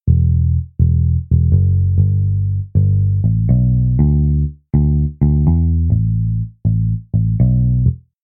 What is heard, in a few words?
bass